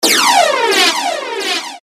initial sweep

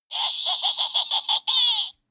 halloween toy vocal distortion

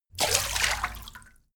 splash

